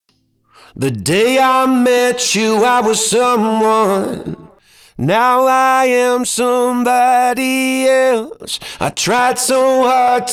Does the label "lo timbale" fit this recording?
No